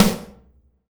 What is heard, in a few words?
Snare, drums; Sonic Foundry (Sony Creative Software); Drums & Drones; Construction Kits; Ventria Kit